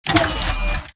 Stalker Mvmt Servo Damaged Light Medium; Titanfall 2

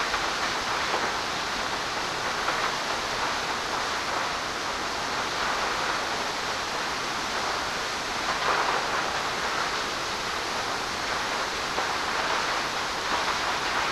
Small Water Fall Bottom Dist; Homestead; Titanfall 2

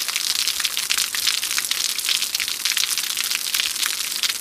waterfall bottom; Titanfall 2

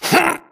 grunt death neck; Titanfall 2

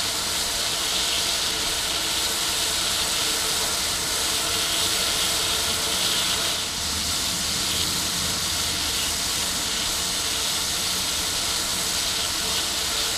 steam small; boneyard; Titanfall 2

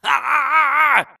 grunt electro; Titanfall 2

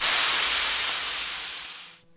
imp bullet energy default wash; Titanfall 2

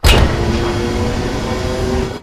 Solar Panel Rotate Start; Boomtown; Titanfall 2

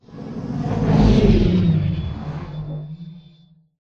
deploy from mega; Titanfall 2

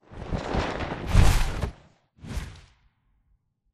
flyer perched takeoff attacked med dist; boneyard; Titanfall 2

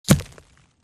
bullet impact; Titanfall 2

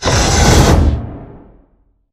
sliding doors open slide; beacon; Titanfall 2

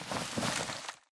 spectre curb stomp grunt; Titanfall 2

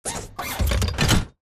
combat walk to idle forward; Titanfall 2

